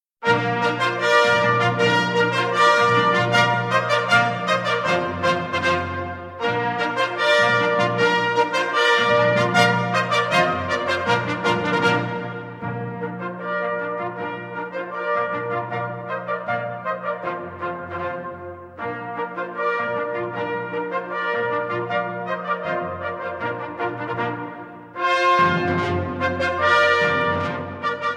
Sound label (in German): marsch, Trompeten und Pauken; festliches konzert; diabelli; marsch nr 1